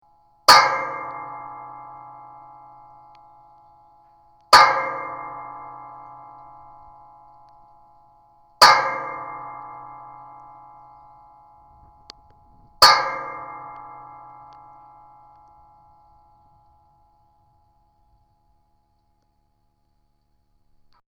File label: Baby Contact Metal